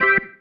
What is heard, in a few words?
Stab One Shots, Organ